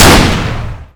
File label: rifle; AssaultCube